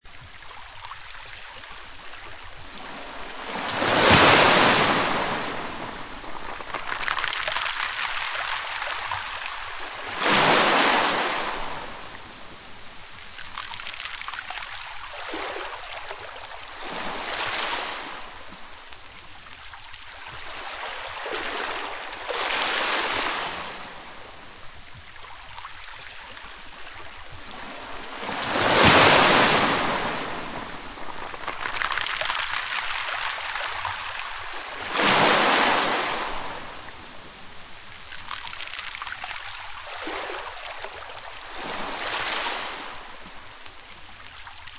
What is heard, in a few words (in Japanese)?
小石の海岸線